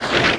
Skeleton Dmg

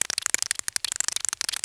Crab Walk; Seer; GamigoNA

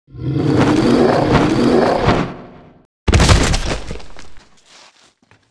ape die; Seer; GamigoNA

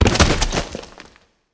MudMan Die

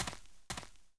Walk

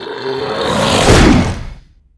Dust Golem

